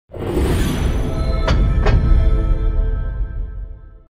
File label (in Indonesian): Nada Dering untuk WhatsApp; Mobile Legends